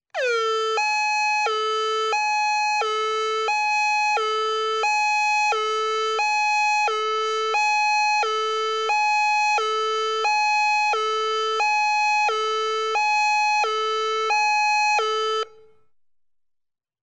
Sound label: traffic